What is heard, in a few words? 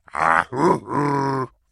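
sounds of voice of caveman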